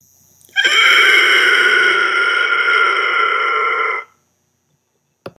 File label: Eeek